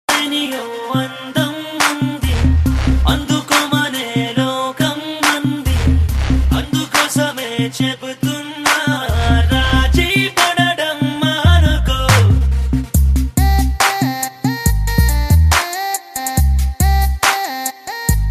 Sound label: sound bass; Indian